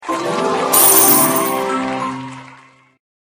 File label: Victory; RedHotRoast; GemCrushAssets